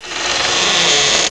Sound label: creaking